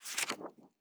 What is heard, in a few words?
Rustling Paper Page Turn